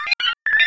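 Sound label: sphere blips large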